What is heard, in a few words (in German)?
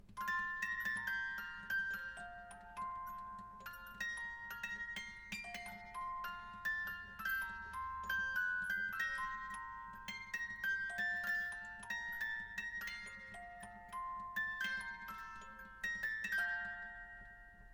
Wiegenlied; Mozart